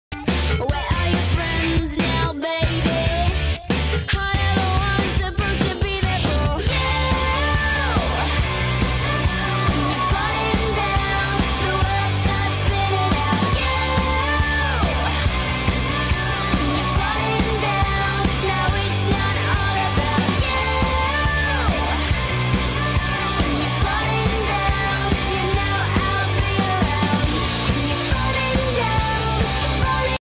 english ringtone

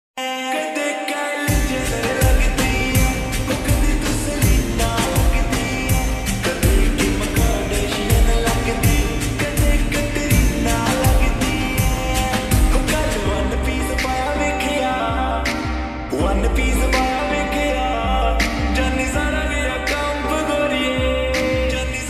slow reverb punjabi rap ringtone

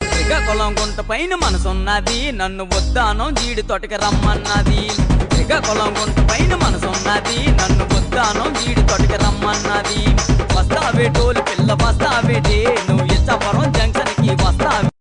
Folk ringtone

